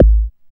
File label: BD ANALOG; Sound & Vision - Gigapack II CD 2